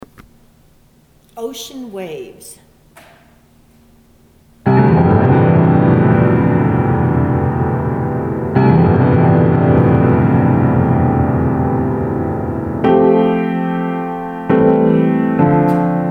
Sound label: Ocean Waves; Things Bright and Beautiful